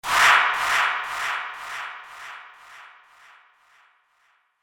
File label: Copper Whoosh Echoes; Whoosh Sound Effects